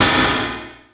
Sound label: IND